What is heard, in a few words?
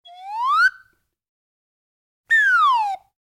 cartoon sound effects; sound ideas